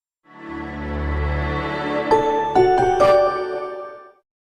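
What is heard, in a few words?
Huawei power on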